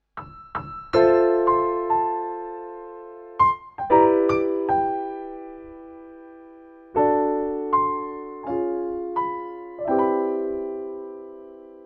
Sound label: Kurzweil Forte SE Recital Grand 9ft